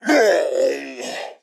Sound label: Shield Death; Pecheneg